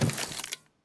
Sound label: bump mine pickup; Counter Strike Model Pack; CS:GO; survival